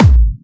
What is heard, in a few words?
Clubby Kicks, Bassdrums